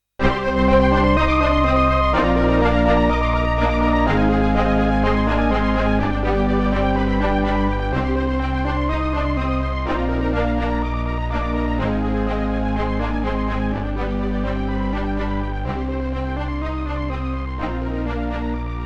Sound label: Strings II; noigesoft; Echoes